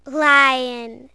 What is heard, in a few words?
lion; animal fun